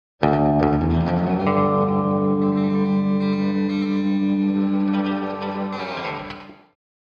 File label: Electric Guitar Twang - Texas Melody, (Slide Guitar); Hollywood Edge; sound designer's toolkit v2